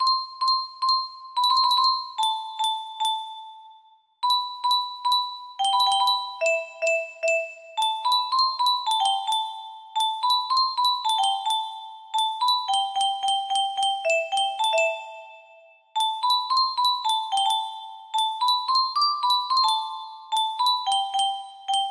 single tone faster faster higher pitch, music box melody